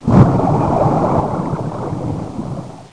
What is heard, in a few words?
Volcano